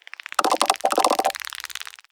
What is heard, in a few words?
Crab Scream; We Are the Dwarves